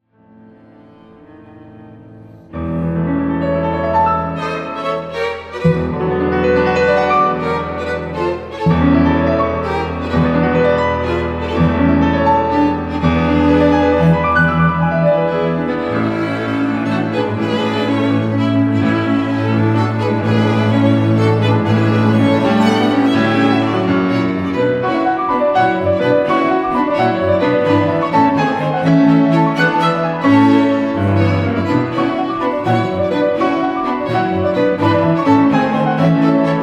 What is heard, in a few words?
Piano Quintet; Reinecke